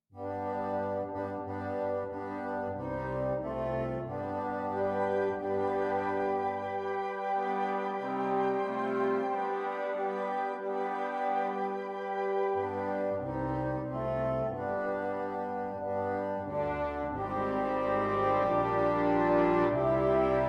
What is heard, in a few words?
for Chamber Orchestra